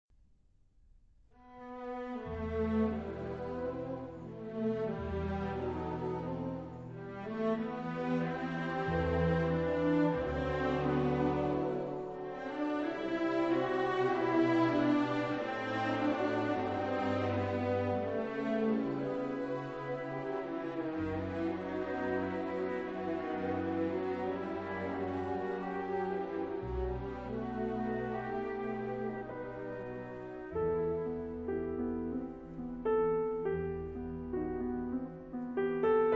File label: piano Reverie; Concertante